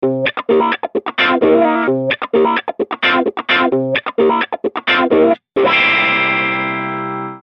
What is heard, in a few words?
Electric